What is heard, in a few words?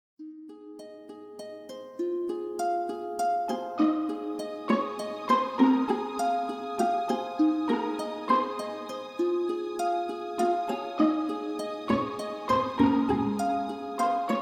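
iPhone 11 Approach, ringtone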